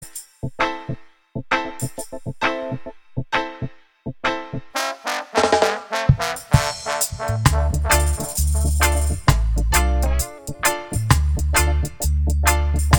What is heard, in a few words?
roots blues